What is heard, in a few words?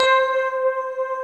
SYN K-2000, Roland